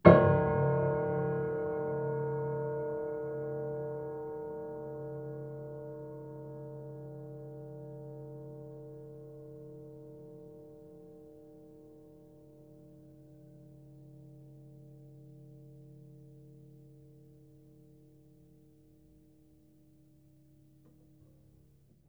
Harm Ped Low, Piano Harmonics

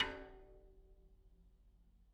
Bicycle Spoke

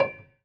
Prepared Piano

